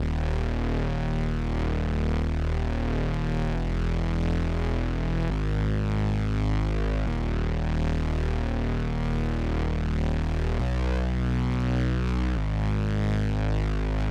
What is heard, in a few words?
new bass